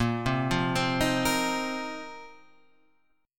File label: A sharp Suspended 2nd Suspended 4th, Guitar Standard; arpeggiated; JGuitar; 6,3,3,5,4,6